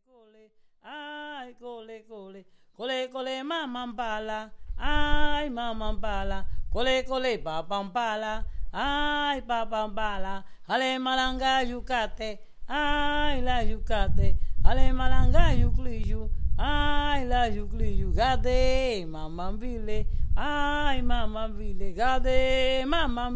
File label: merengue